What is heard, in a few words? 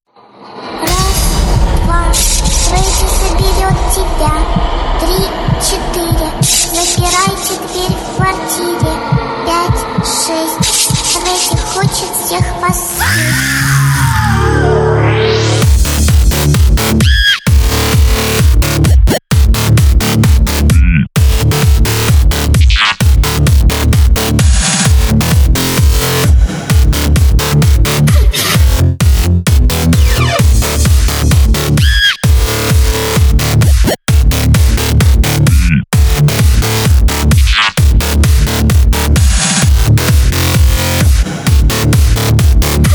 Electro Drum